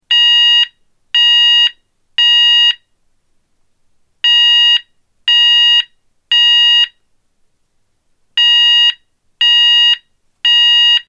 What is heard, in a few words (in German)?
Ton